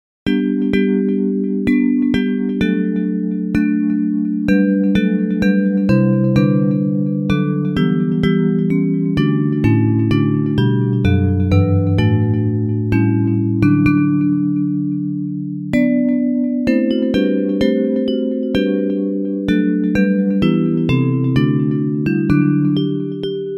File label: Bells